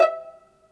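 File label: PIZZ VLN B3, violin; AKAI S-Series CD-ROM Sound Library VOL-2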